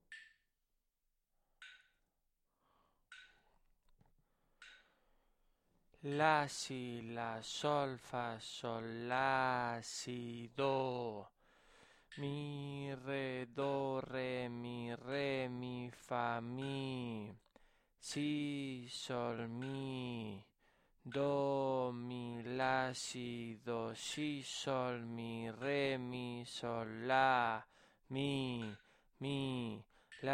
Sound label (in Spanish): RITMO